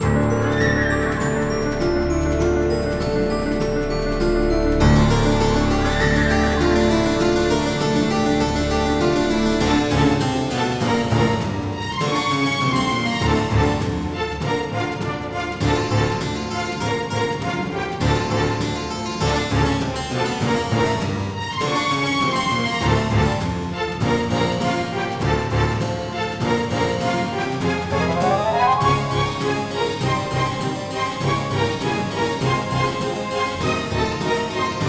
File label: Combat Loop; Flying Rats Hole; Curse of the Sea Rats